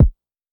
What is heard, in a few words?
Kick